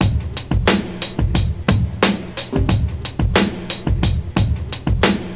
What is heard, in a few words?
Beat, Drums & Percussion, Electronic; Sonic Foundry (Sony Creative Software) - Electronic Point Blank